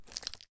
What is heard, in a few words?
Key Pickup; Phasmophobia